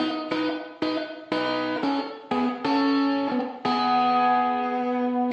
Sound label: 090 guitar countryesque; GUITAR LOOPS